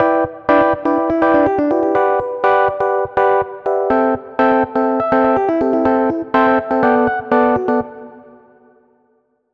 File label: Hybrid Advanced House Deep House; midi wizard